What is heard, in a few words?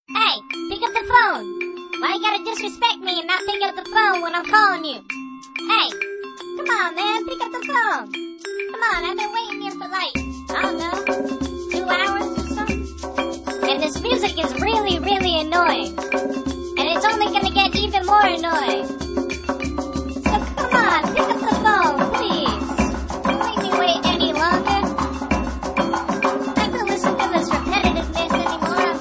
Annoyed